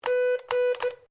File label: MORSE CODE G; Morse code aplphabete; Morse Code (Sound Effects:Electronics)